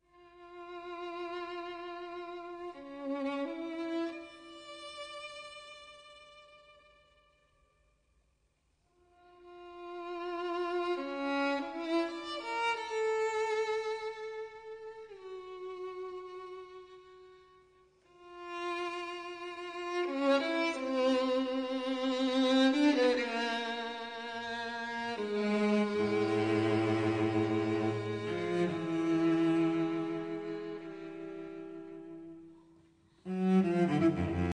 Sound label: chamber music